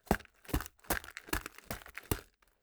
Footstep Fullstep Rockslab Stones Jogging Plimsole Light